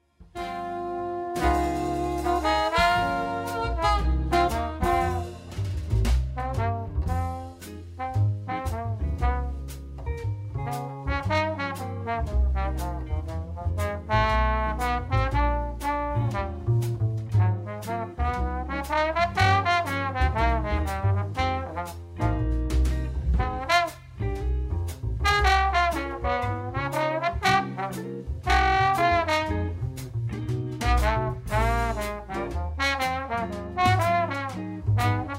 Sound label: Funky Deep Freeze